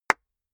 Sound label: clapping very slowly